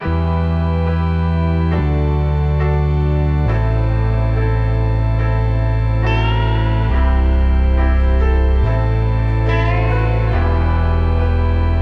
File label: keys, Loops, Sad